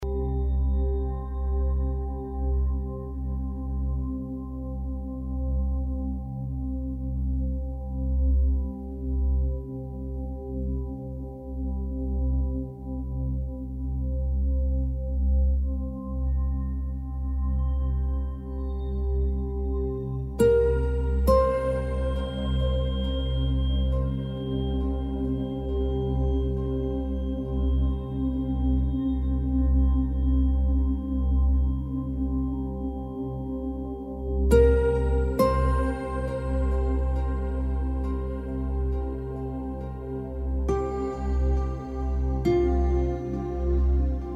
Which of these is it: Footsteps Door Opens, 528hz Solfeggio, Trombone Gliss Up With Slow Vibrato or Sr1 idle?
528hz Solfeggio